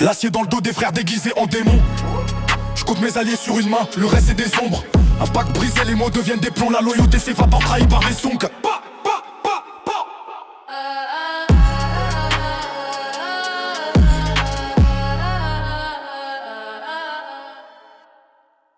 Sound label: Drill Rap; rimes embrassees